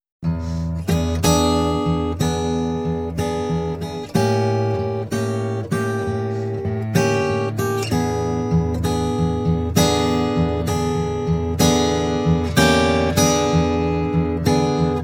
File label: Acoustic blues